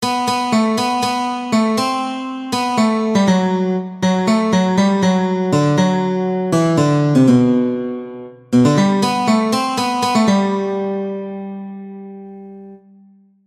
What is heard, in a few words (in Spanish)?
Guitarra